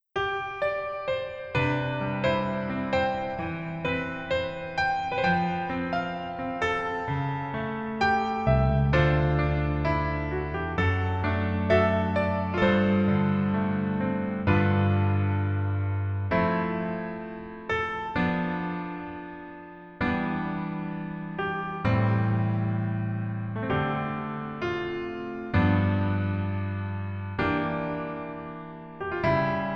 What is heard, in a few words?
Piano ver